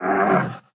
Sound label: Damage